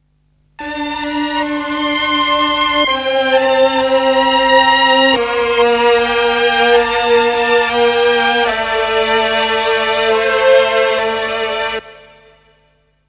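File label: sad strings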